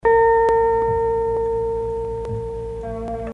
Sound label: Guitar; D n B